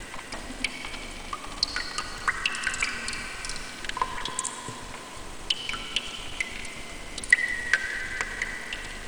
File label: Cave Water Droplets; ruined-tomb-of-the-bound